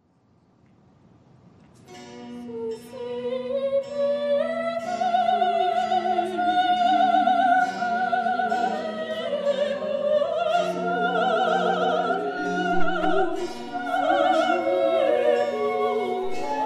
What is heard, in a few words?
Aria (soprano I II; Magnificat